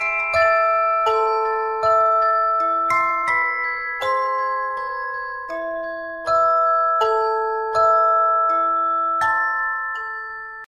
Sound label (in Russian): klassnaya melodiya na sms; tainstvennaya sms